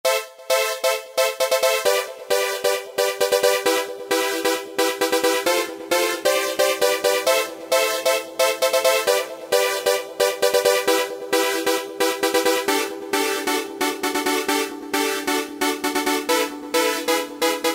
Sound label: PULSA LEAD; Best Service Alpha Dance II